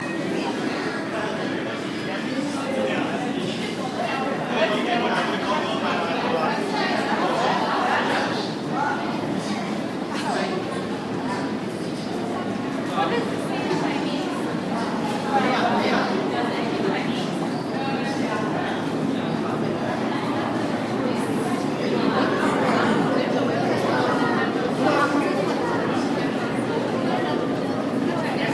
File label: Shanghai subway walking